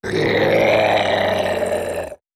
zombie growl

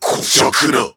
monster damage, voice

